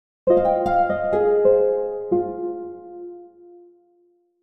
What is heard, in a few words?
harp flutter